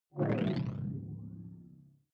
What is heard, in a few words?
sci-fi alien big bionics; swooshes-swishes